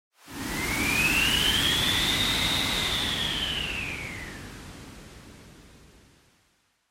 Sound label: Wind Synthetic